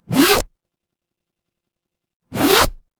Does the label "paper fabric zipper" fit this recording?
Yes